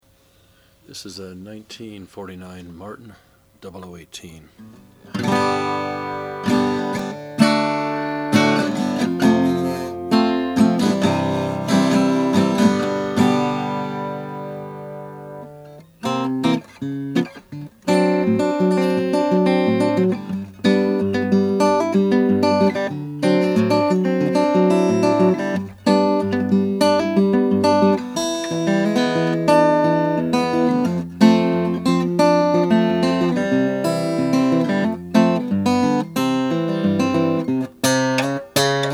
martin 00-18 natural

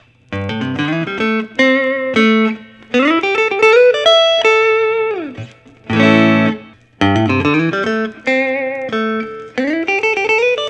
dpergo limited semi hollow; indig

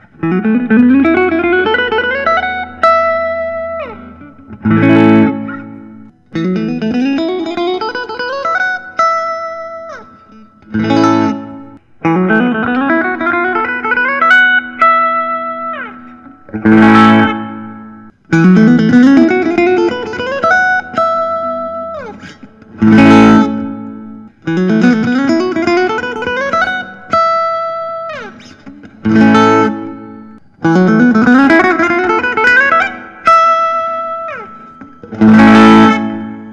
parker fly deluxe emerald green; 1996

